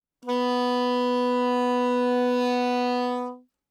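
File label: Soprano Saxophone non vibrato ff B4; Woodwinds; Bb Soprano Saxophone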